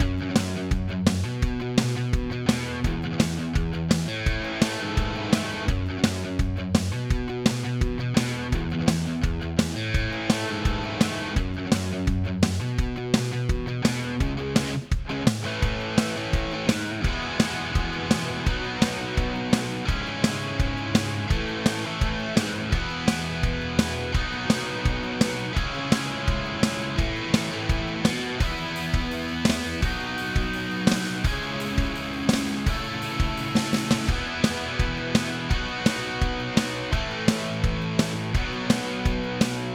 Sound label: Verse Chorus